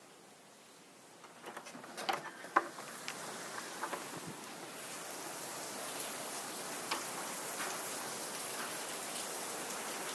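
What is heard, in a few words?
a little thunder a capella giggles, female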